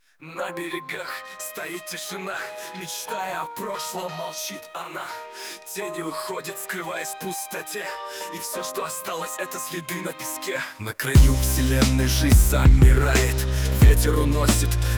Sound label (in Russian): krik